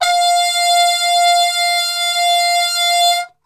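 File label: F 3 trumpet cup mute; Best Service; Brass Super Section; TRP CUP MUTE; 90 s Sample CDs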